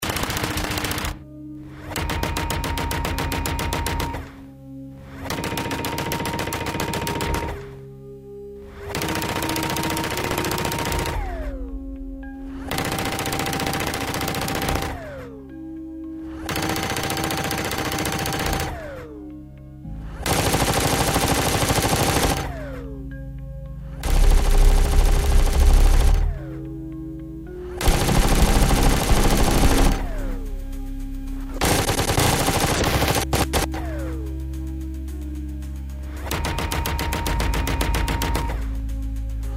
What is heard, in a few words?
Gatling, cannon sound fx